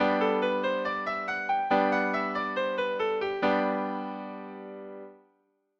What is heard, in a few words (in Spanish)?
Sol mayor, escalas